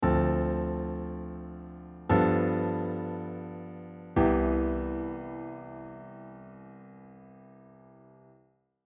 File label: voice leading